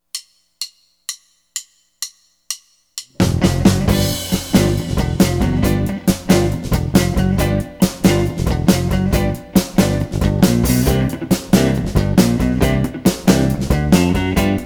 Funky Blues in G

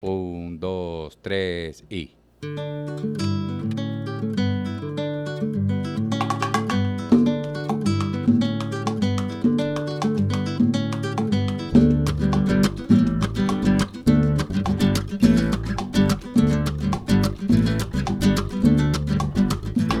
Montuno; cubana

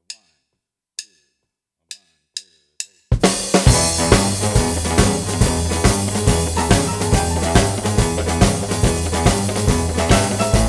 harp, Rockin' Boogie in E, blues

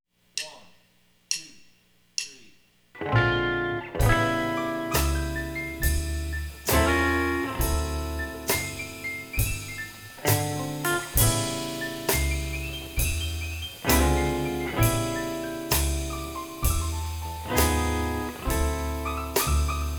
Slow Blues in A

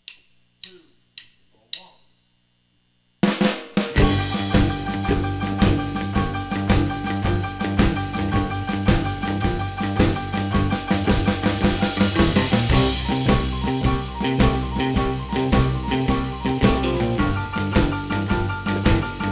Medium Shuffle in G, blues

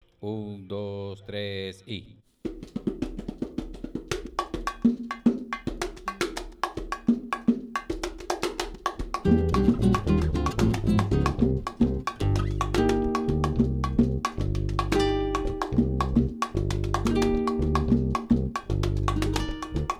Guaguanco; cubana

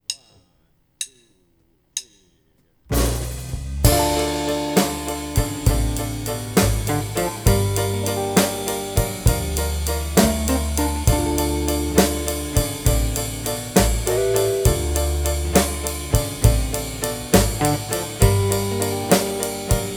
Slow Minor in D, harp

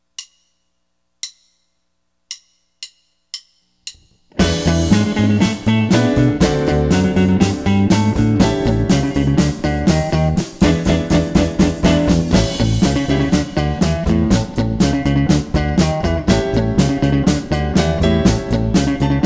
Chicago Blues in A

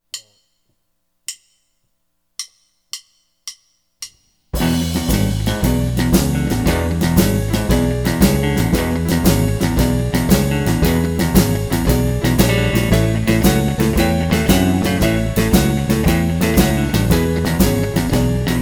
Big Shuffle in E, Blues

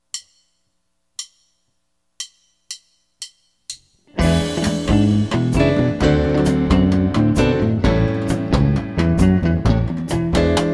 Rhumba in A, Blues

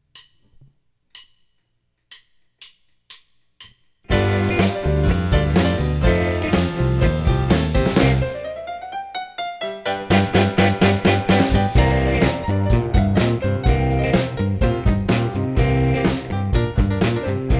Funky Blues in C

